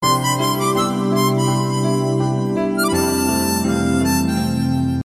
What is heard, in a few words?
nice harmonica sms